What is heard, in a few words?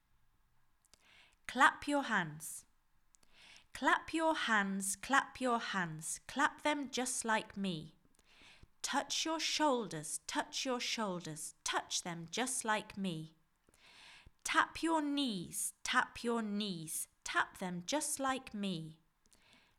Clap Your Hands